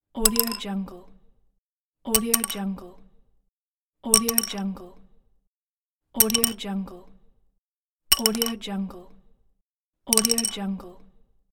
dropping a pencil; jasmusicuk

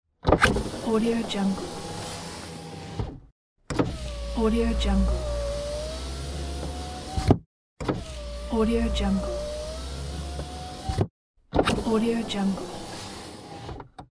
car window

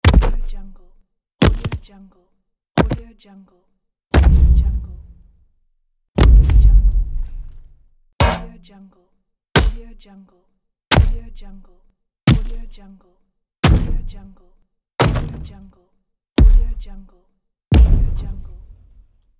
thud impacts sound pack

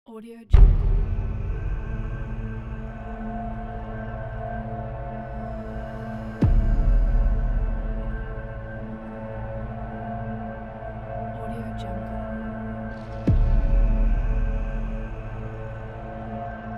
zombie attack horror

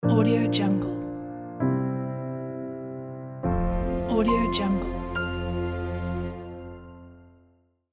piano logo

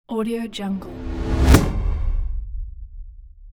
trailer hit

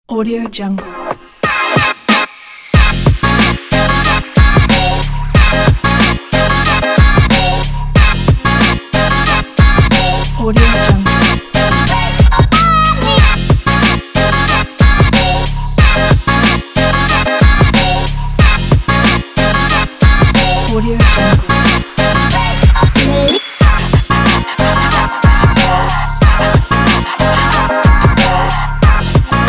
hiphop is hiphop

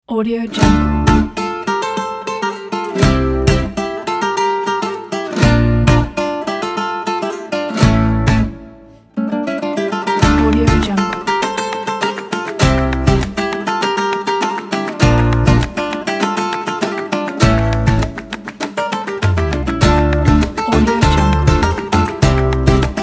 spanish guitar

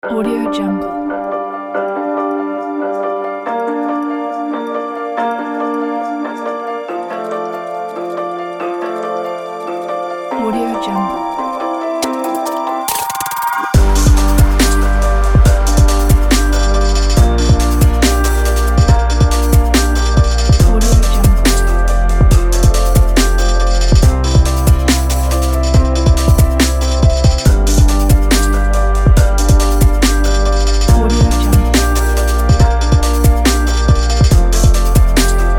abstract motion pack

